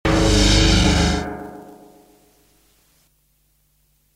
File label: Stinger